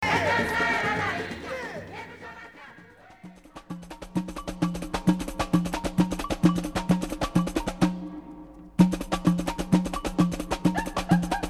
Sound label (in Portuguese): Batucada